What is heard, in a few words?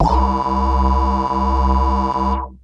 Techno leads, hits and riffs